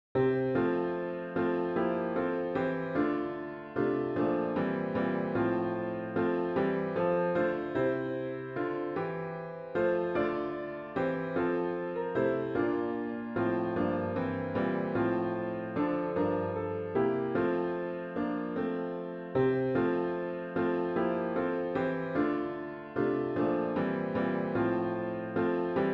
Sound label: piano only; The Spirit Sends Us Forth to Serve